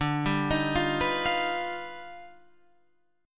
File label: Eb Augmented Major 9th D